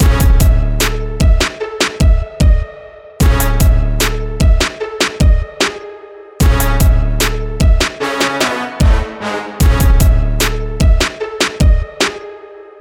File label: trap upfront sample; instrumentals